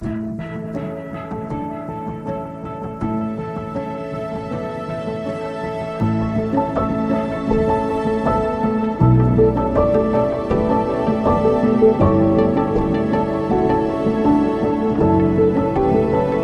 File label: No Perc